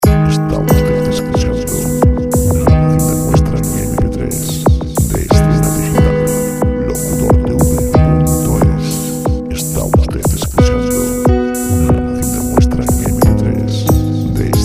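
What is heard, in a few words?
91 bpm lent, music chillout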